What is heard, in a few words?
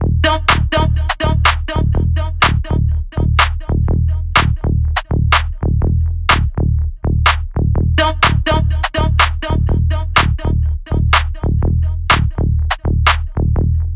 One Shots Beat